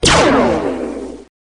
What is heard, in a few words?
Laser Blasts